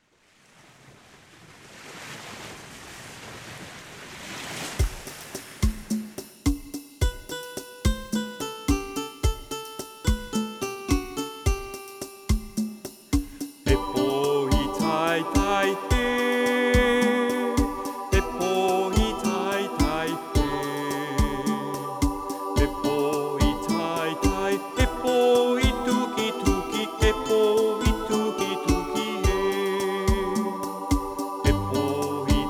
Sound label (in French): voix 1 et 2